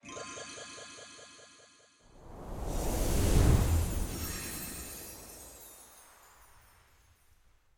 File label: Get Item